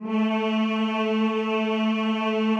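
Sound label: choir A2, men; Sound & Vision; Gigapack I CD 2; CHO Mmhh + Rrrr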